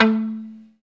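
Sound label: guitar mute; 90's Sample CDs; Club-50 - Foundations Roland; Funk & Mute; Chunk Mute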